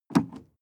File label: sports car int pedal gas step hard; verkehr transport; autos